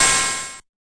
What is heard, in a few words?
FM Cymbal, harsh; Harsh FM Cymbals